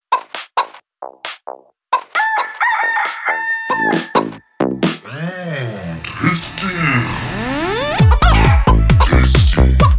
chicken techno

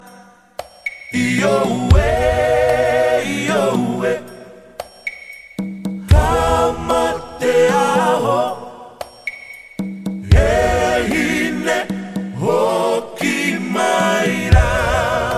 fiji chant of the islands

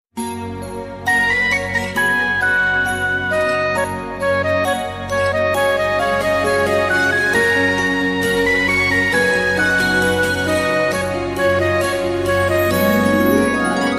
x mas ring

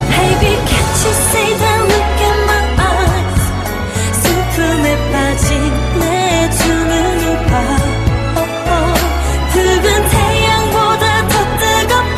cry cry

